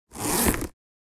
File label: Purse zipper noise open close